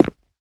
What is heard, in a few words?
rubber, footsteps